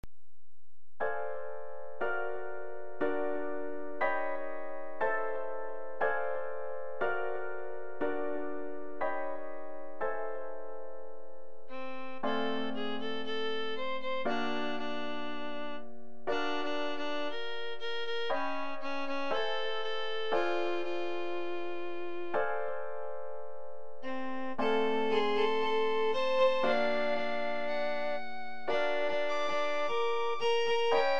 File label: piano trio beginning